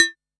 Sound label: Analog SY1 Perc